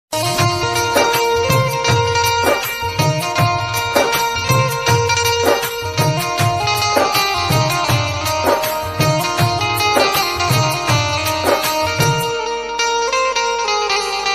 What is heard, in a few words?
love bgm ringtone flute; tamil; trending tamil ringtone